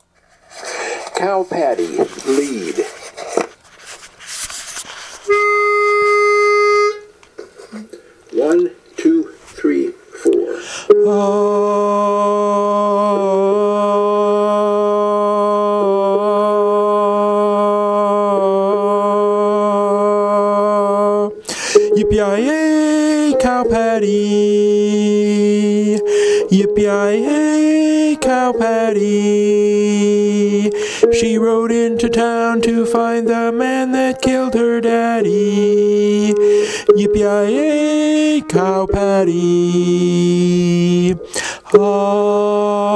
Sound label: Lead